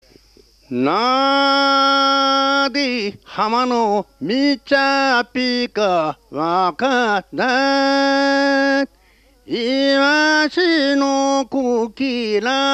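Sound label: lullaby; minyou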